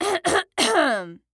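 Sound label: throat clearing, "kkhm"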